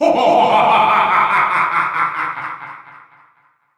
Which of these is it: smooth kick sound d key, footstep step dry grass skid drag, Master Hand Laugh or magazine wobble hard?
Master Hand Laugh